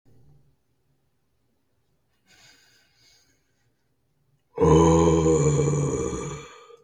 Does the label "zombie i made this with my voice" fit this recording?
Yes